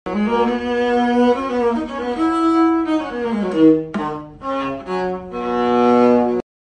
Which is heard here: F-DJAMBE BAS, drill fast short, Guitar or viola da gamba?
viola da gamba